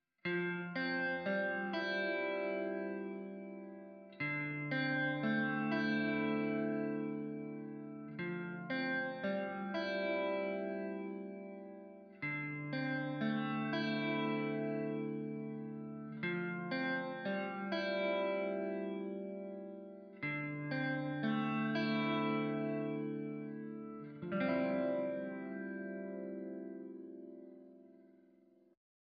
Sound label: Rotary Speaker, modulation; modulation pedals